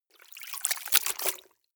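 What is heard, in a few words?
clothes water up down medium, household; haus-garten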